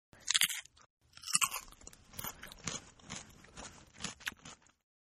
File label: Crunch n Chew, Eating; Sound Effects:Human; Crunch N Chew 4